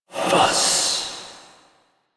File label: Fire Whispers; Warframe; Tenno; Grimoire